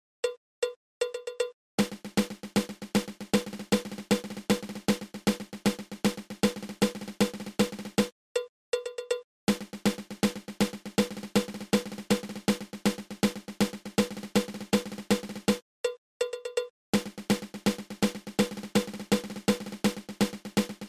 Single Drag Tap

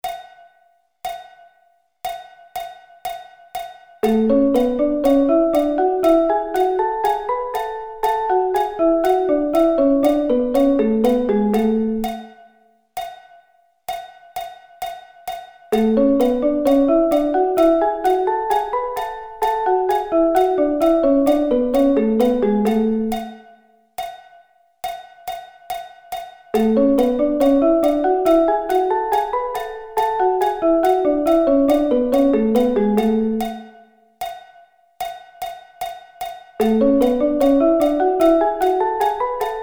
A Thirds

